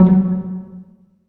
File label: PIZZ, Dance Mega Synth